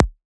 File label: Perc, Percussion; FLPRO